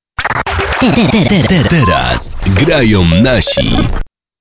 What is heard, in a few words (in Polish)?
JINGIEL; TERAZ GRAJA NASI